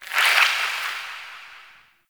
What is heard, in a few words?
Critter Squeak; Warframe; Ambience; Nokko Colony